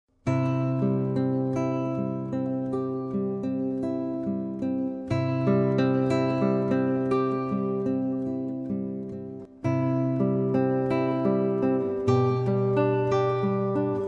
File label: Lullaby